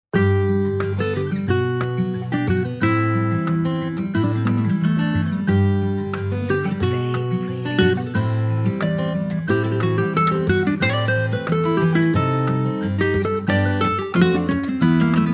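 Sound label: Acoustic Bossa Nova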